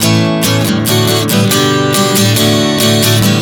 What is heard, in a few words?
A-D 140 bpm, acoustic guitar chord progressions